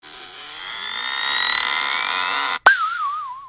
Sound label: Facebook Emoji Tone